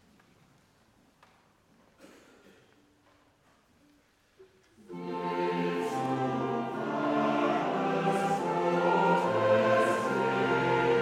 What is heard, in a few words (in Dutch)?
Cantate 180 koraal